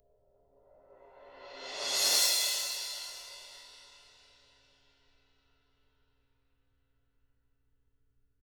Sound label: Drums, ZILD MALLET